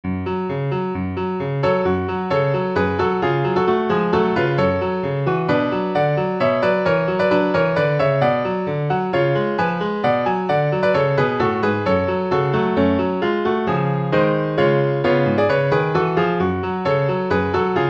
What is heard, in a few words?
Piano Solo in F Sharp Minor